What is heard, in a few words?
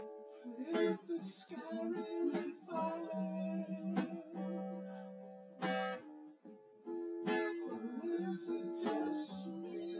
nodrums synthconv 250ms 5cm; SiSEC08; SiSEC underdetermined